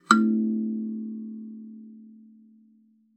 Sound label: KALIMBA A3; Xsample VOL-7